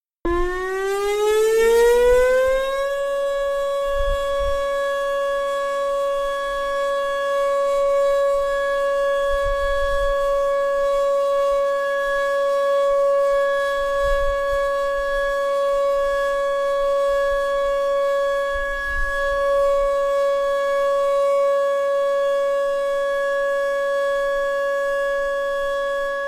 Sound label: Siren; Norman